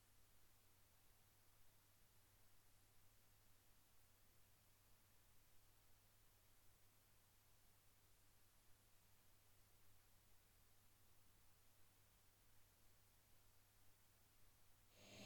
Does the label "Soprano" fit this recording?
No